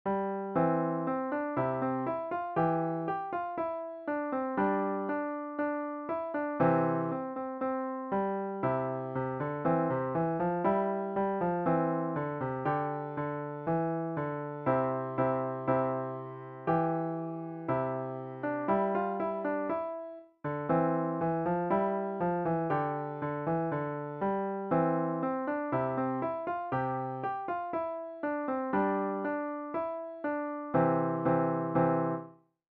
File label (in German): Shaker Tune A, Klavier